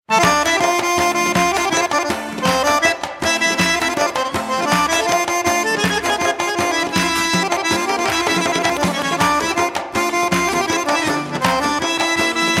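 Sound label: Kavkaz Garmon, Caucasus accordion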